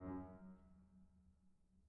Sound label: harm F#2, Grand